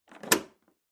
door deadbolt

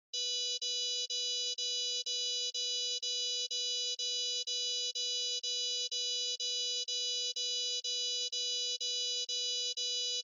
Alarm Damage Alarm; alarms

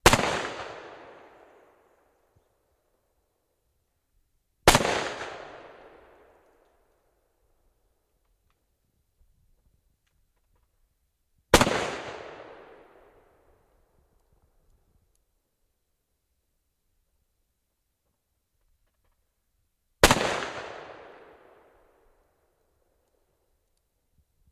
357 Magnum Hand Gun

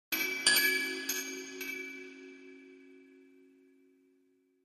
Chinese Chimes Bells

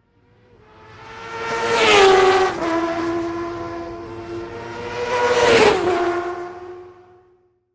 Motorcycle Road Racing

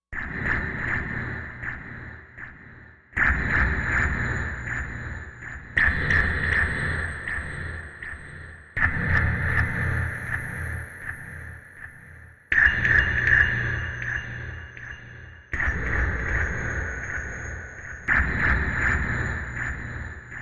Grim Oscillator Machi, eerie ambience

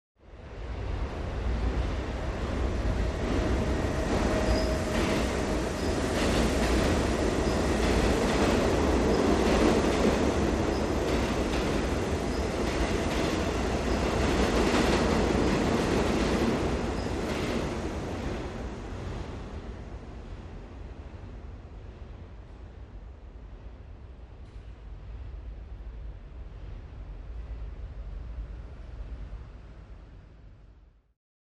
Subway Train Close

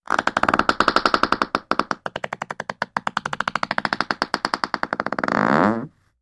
Creaky Fart, farts

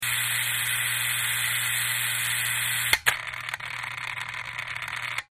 Relay Electric Burs, buzz

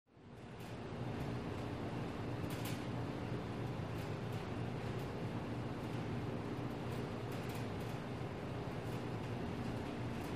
Supply Room Ac Rattle

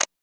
Camera Shutter Clic, shutters & movement

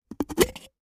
plastic lid open

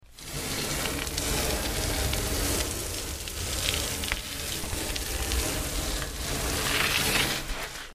Sand Bag Dragged Scra

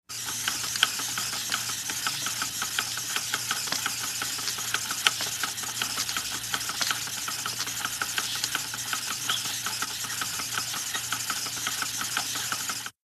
Small Machine Run; machines

